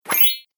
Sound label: Pantomime Fairy Attack, Cartoon; sound design